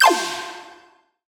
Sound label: Collectibles Classic FX; Video Game; Cymatics; Video Game Sample Pack Vol 1